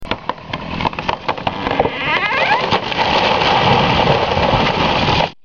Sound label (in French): Arbre qui tombe